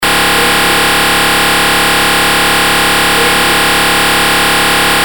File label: beep